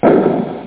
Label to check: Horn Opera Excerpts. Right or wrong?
Wrong